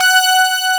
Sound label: G5, SYNTHESIZER ANA LEAD; AKAI S6000 CD-ROM - Volume 1